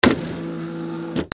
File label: OFFICE